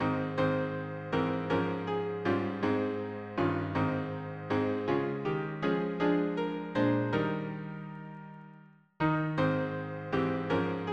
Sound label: harmony piano